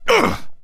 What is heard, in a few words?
hurt light